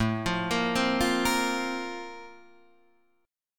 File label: Bb Major 7th Suspended 2nd